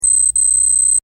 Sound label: Beeps Data Transmission Technology; Beep Sound Effects; Scifi Science Fiction Multimedia; BBFX